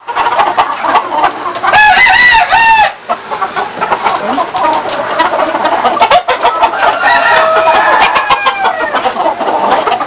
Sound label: Chicken